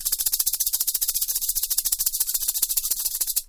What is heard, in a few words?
rattle